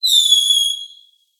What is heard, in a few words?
Fireworks Single Close Whistle; InspectorJ; Essentials 03 Fireworks